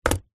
office pen ball point set down on paper on wood table; zapsplat